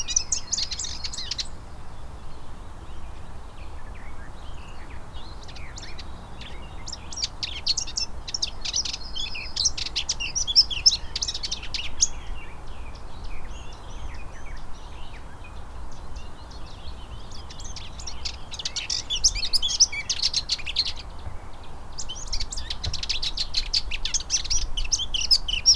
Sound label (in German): Weissbart Grasmuecke; Landschaftspark Unterhaching; Michle Peron